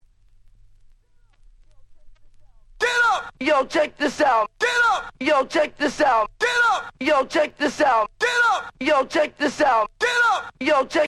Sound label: dusty scratch